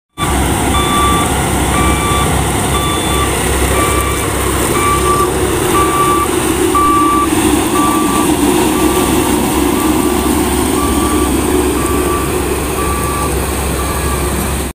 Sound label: Road roller